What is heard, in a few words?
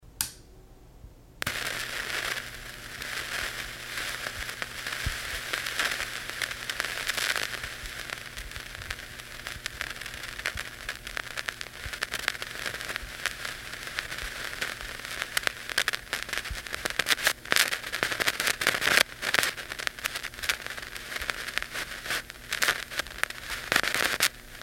krk static pops; cakewalk